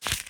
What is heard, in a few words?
flip back; Lucky Fortune; Wazdan